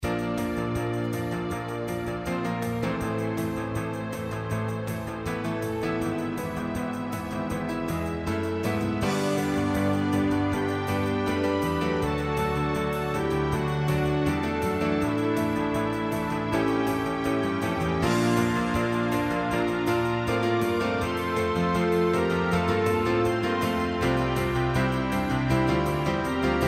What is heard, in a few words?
Rock Sonata